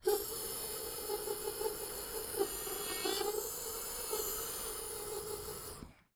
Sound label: singing female residual